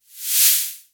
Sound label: Swoosh